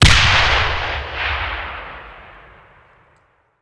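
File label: Artillery Grenade Launcher; Sound Ideas; Guns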